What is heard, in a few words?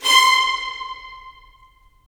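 strings violins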